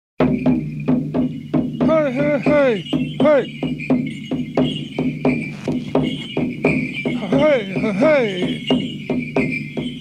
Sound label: corn drums chants